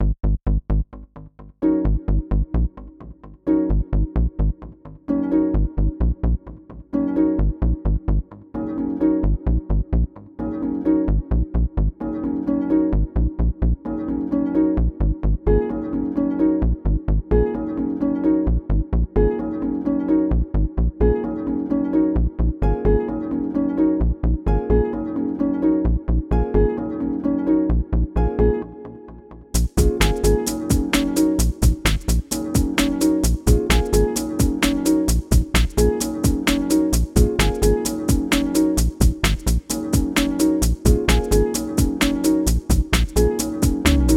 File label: Ambiance Atmospheric Grooves; Synapse